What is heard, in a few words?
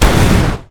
impact airblast; Garry's Mod; tf2